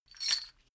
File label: metal chain movement single